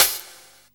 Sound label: SD, dance drums hip